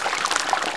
boiling; 1997 MMX LAN PACK (win 95); Army Men

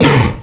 GRUNT; QUAKE 1; HKNIGHT

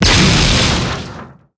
hit med; 1997 MMX LAN PACK (win 95)

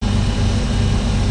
tank; 1997 MMX LAN PACK (win 95)

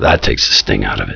Sting Out; 1997 MMX LAN PACK (win 95); Army Men

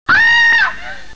SCREAM; Carmageddon

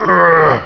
PAIN; Quake 1; HKNIGHT

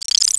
ant attack; 1997 MMX LAN PACK (win 95); Army Men

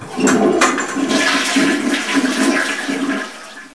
toilet flush; 1997 MMX LAN PACK (win 95); Army Men

